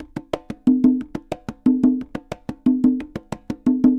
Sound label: Congas, Festa Latina, Drums & Percussion; Best Service; Festa Latina VOL-1